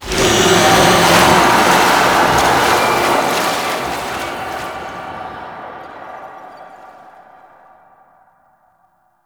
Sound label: Hex Melt; gameplay; Warframe; NineteenNinetyNine; Stage11FuturePast